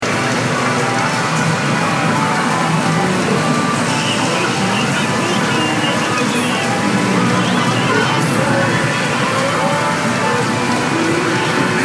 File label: pachinko parlor Tokyo Japan, Games arcade ambience; Games arcade ambience, Tokyo, Japan. Pachinko parlor, casino ambience. Cacophony of noise f; Arcade Sound Effects